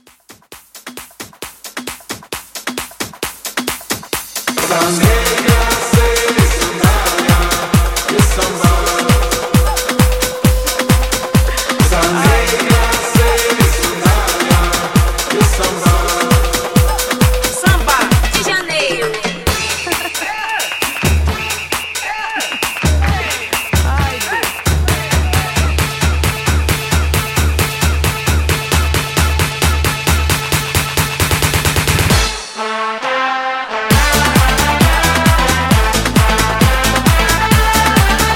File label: Club Hype Re Drum 133